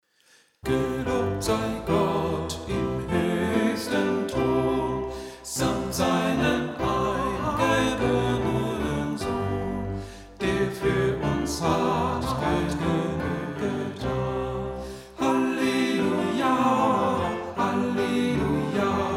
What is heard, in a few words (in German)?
Oberstimmen; Gelobt sei Gott; Vulpius; Hochschule Herford